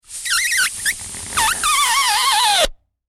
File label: Foley Balloon Expel Air Squeak; Balloons; Cartoons; SFX Bible 6